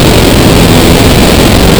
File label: harsh noise straw